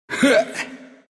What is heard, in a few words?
grunt, ru; dota2